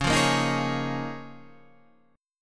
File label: Db 6th Add 9th Db, down, Guitar Drop D